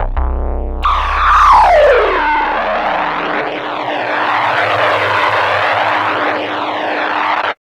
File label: BLURP LOW, Hard & Loud Techno